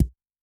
Walkik, Kick, Yamaha DTXpress-II

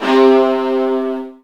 Virtuoso Strings

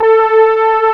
PAD PAD; SYNTH-PADs; Synth-Pads 3

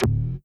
Stabs, Elektron MachineDrum; SPS1 MKII

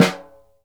SNR, Synclavier Percussion 2 - World & Orchestral

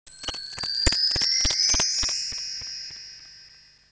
Perc; Coldcut's Kleptomania VOL1

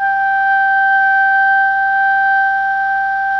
SOPRANO G 4, Saxophones; PP; Greytsounds; Philip Wolfe David Crozier's Saxophones

